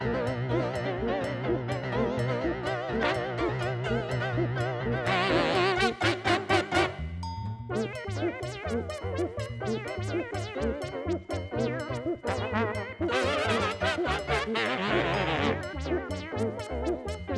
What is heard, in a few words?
Fight! Low Health